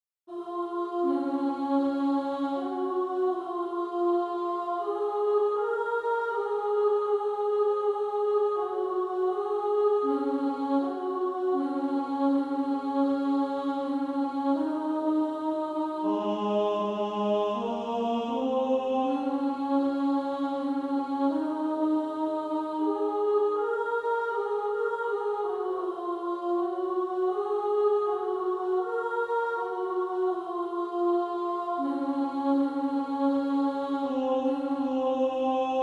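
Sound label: Female Choir, alto 1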